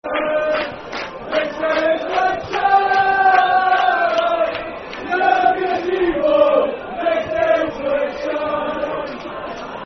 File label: Football Chant; Gazebo; Dundee United Dufc